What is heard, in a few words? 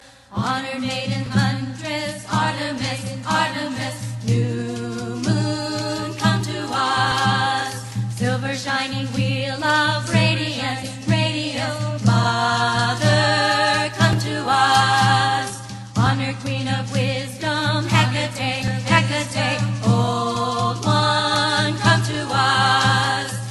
Triple Goddess Chant